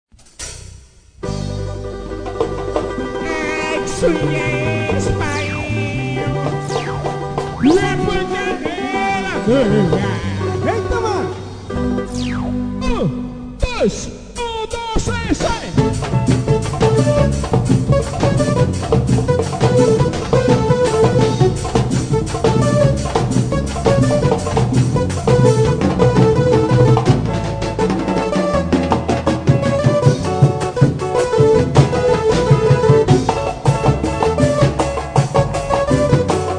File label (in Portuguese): abertura